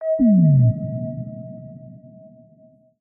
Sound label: node deactivate; ui